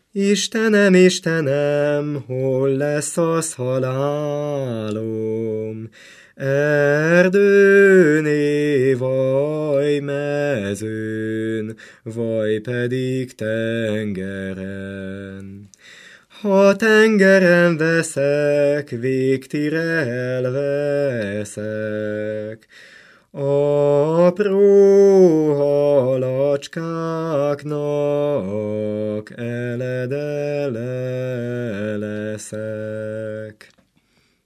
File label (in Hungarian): nepdal; istenem istenem